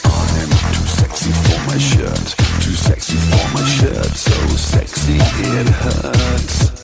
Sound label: zombie breath